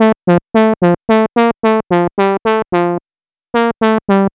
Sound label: Synth, Trip-Hop Loops; Sonic Foundry (Sony Creative Software) - Rondo Brothers Trip-Hop Loops & Samples; Apologists